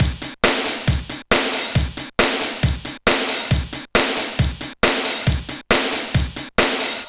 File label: Dynamic Drums Pattern; Oakvalley